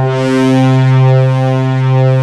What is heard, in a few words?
BIG MOOG; USB Soundscan vol.49 - Analog Fantasies; AKAI